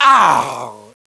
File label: death; SINDEMO